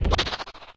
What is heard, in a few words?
portal exit